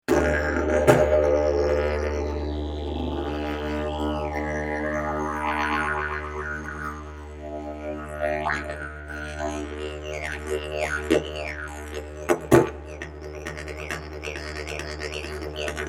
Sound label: didge